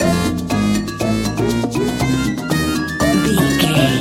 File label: jungle hijinx loop